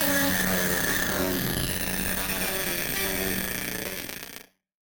Battered Synth; malfunctioning synth samples

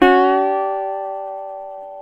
GTR, Dobros, National

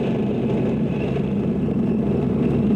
US ARMY TANK, military; Greytsounds VOL-1

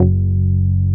SHAKY F#1, BASSES

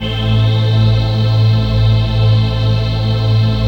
PAD, Dance Mega Synth

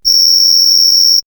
Cricketing, ambient synth fx; X-Static Goldmine

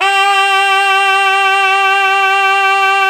mizmar

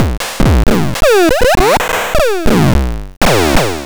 Nasta Hit-Stix2

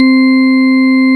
HIP, HIPHOP; Rubber Chicken Software Sample Bank

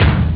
hard bdrum; ST-XX Amiga Soundtracker Sample Packs

